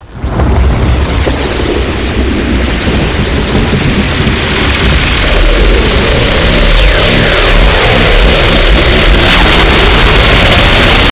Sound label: ion warmup